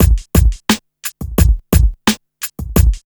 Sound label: RNB 87 BEAT